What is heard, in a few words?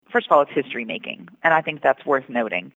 all female